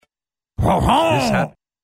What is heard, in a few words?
French Lawnmower; Rob Ellis